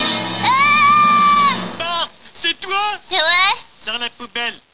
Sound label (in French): cri; Haaaa ! Bart c'est toi ?.. sors la poubelle !